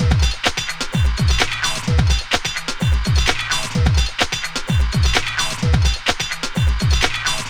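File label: 128 Pop Combo Beat G minor; Digidesign; Pro Tools; Pro Tools Essential Loops; Pop Rock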